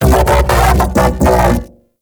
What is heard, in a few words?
Mech Ambient Vocal; Warframe; Vehicles; Thano Mech